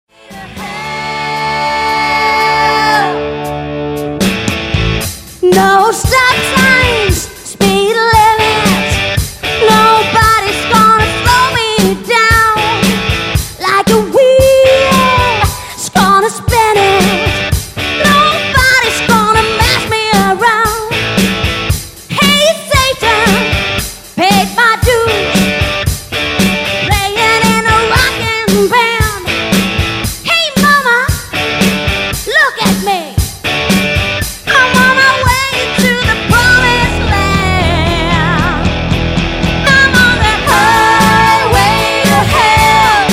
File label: 80 rock